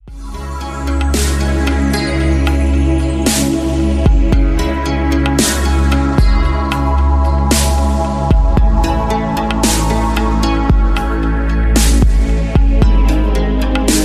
Ringer

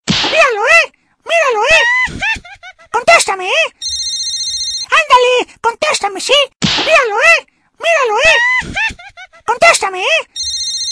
Spanish Ring

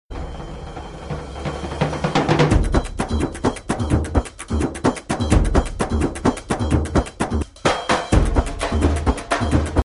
Indian Tribal Beat

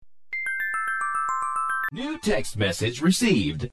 Samsung message

